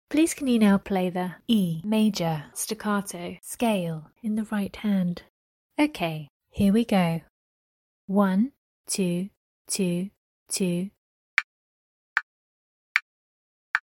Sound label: e maj stac scale rh